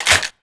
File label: 12 Gauge Shotgun Open; Weapons